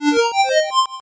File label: 8bit se